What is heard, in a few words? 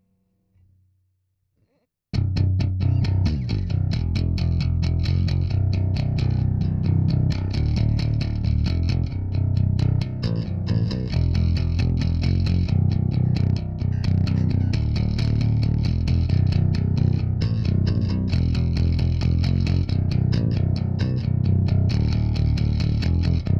audix d6 bass